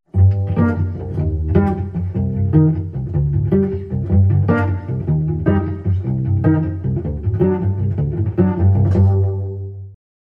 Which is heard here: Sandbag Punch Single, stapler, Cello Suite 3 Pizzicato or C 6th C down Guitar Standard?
Cello Suite 3 Pizzicato